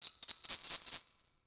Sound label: hawk; Ambient; MX vs ATV Unleashed